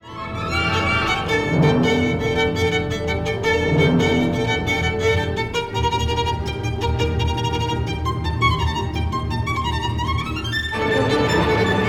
Violin Concerto No. 1 in A Minor Burlesca Allegro con brio